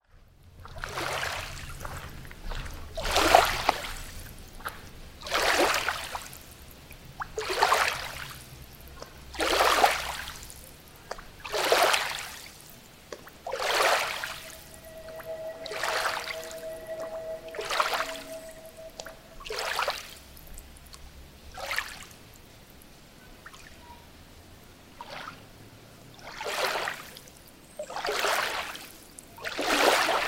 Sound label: Waves On The Lake